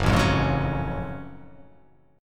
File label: Gb Minor Major 9th Gb down; strummed; JGuitar